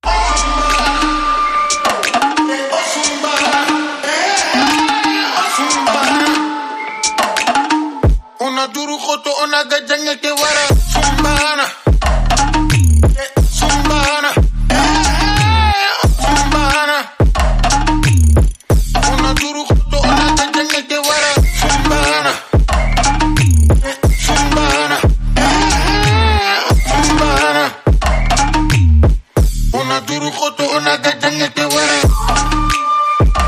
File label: Africanvibes